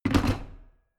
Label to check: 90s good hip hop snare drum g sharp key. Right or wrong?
Wrong